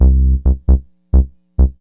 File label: Intro Bass; Sony; ACID Pro 7.0